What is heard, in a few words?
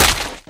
impact wpn large earth